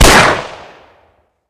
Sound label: glock fire; tf2classic